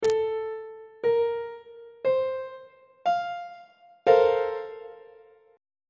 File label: b flat major 7th suspended 2nd 3rd inversion on treble clef